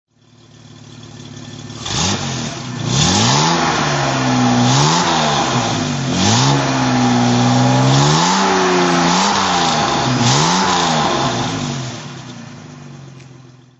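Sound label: Jaguar XK140 throttle; Jaguar XK 140 3.4 litre OTS, 1956; Engine sound; V3D/ ClassicarImages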